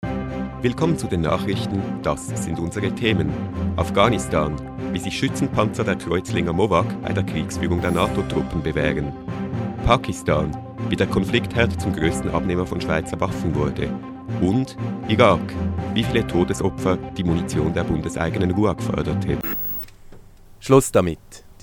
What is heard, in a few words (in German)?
Jingle kurz